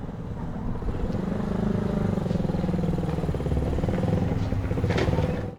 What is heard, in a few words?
Far motorcycle; anemoi